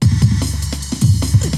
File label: BREAKBEATS, TECHNO